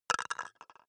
plastic cup drop wobble

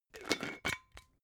glass bottle massive close

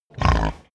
pig grunt close

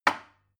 glass put down cupboard

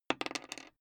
die roll table long

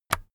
keyboard external key release

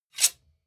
sword scrape fast

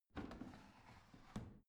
washing machine drawer out soft

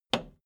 video tape player plastic rewind push

